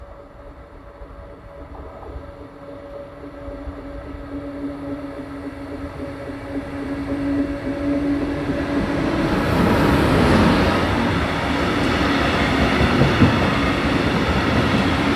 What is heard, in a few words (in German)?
Zugdurchfahrt